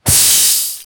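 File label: small explosion then toxic gas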